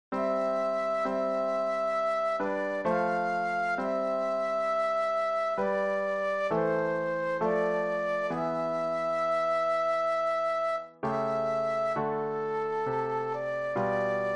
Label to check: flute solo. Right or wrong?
Right